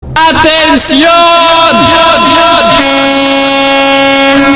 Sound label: spanish horn